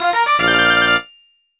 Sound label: Jingle Silver; San-X Chara Sagashi Land; DS